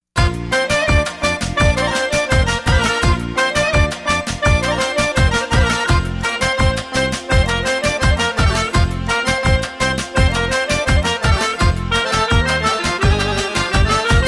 Macedonian Music; meloboom

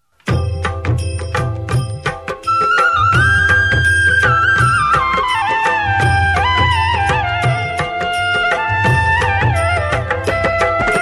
Devotional Flute

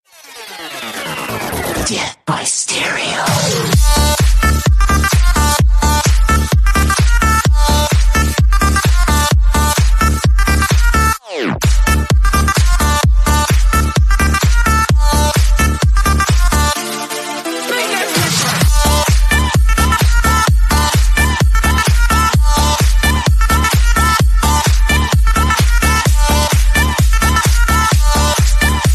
remix dance

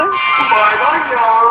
Bye-bye meow

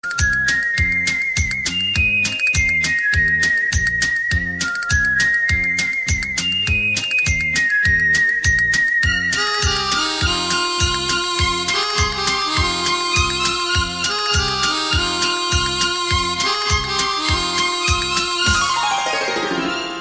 Guitar Woo

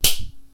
Ruler Smack; Baldi

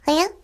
Cute Noise; Siyeon

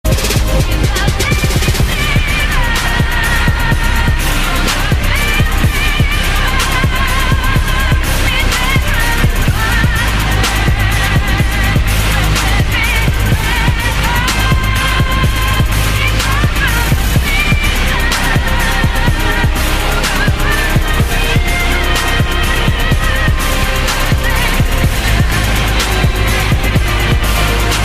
remix ringtone; Aot

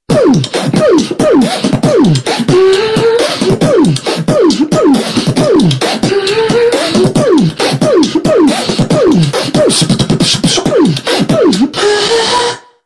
Beatbox

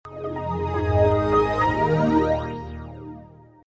Xp Trance

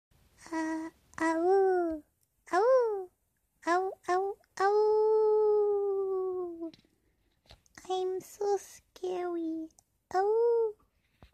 Awoo

